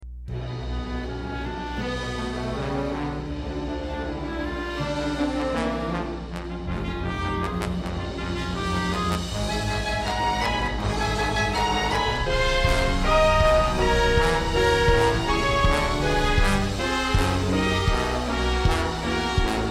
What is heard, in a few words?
grotto destruction